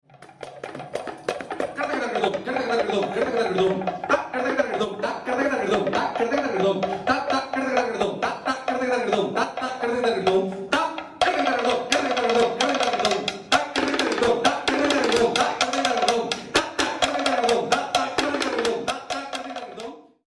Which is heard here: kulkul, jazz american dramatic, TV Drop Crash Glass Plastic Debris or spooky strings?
kulkul